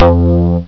BASS

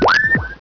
BWEEEP

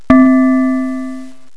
BELL NOTE

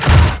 FLING

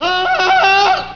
AYAAAAH

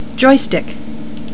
JOYSTICK

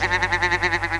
LAFF

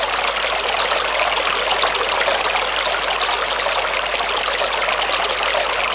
RIVER

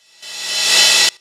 REV CYMB; BEAT2000

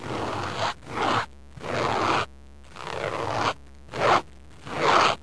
PEELING

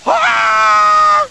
KUNG FU

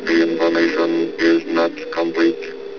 COMPLETE

